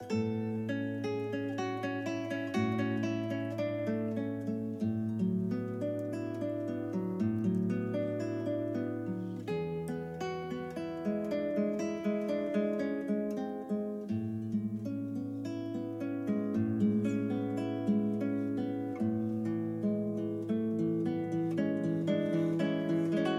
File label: Guitar